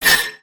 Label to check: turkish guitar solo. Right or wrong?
Wrong